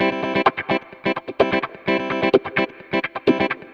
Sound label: Electric Guitar - C maj, 128BPM; Electric Guitar Kit; Vengeance Samples; Special Sounds